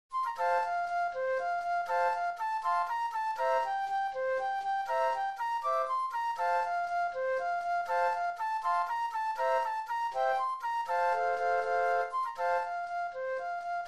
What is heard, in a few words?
Flute Trio